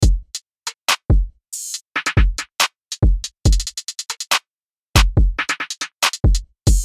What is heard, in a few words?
UK Drill UK Grime 140 BPM